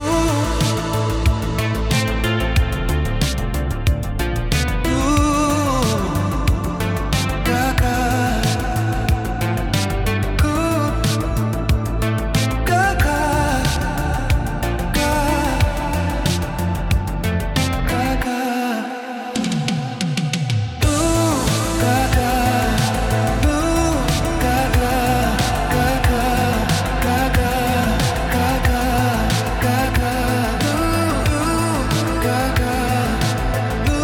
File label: electronica